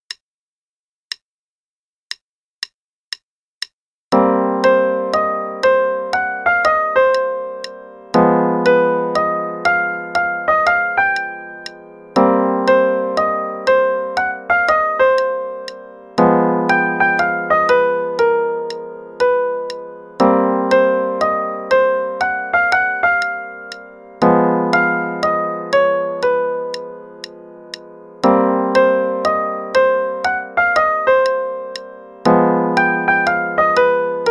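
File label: Pumpkin Boogie, solo only, w click qn=120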